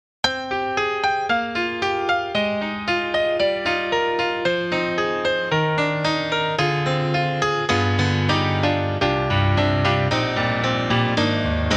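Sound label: Custom C7 Hard, piano; Spectrasonics; Keyscape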